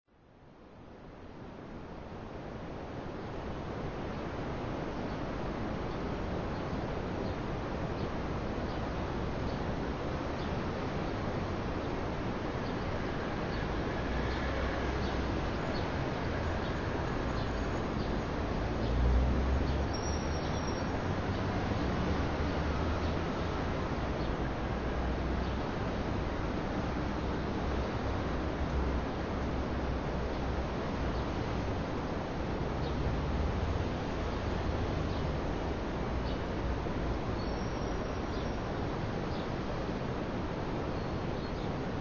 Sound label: City Ambience; GTA Vice City Dialogue